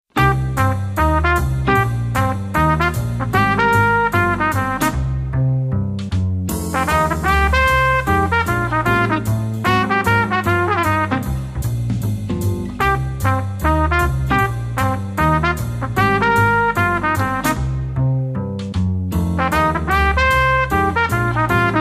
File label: MINOR SONG